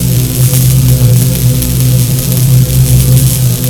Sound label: loop, physgun; Dystopia; weapons